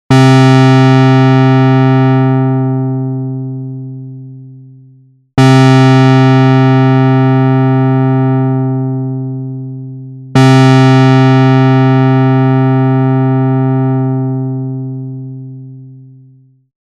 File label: ADSR